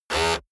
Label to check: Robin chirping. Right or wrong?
Wrong